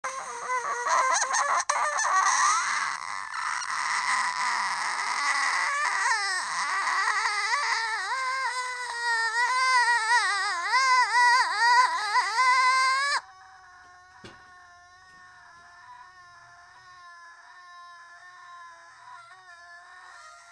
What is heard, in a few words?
tiger thrush